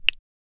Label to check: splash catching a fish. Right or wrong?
Right